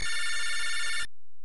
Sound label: ringing; Mega Man BN